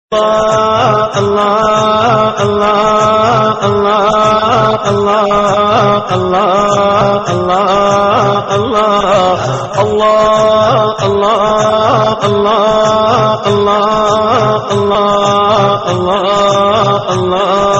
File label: islamic tone allah ringtone